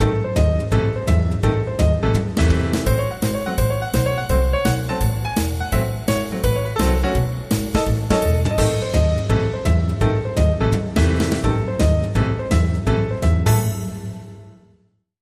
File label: Sting; Night On Bald Mountain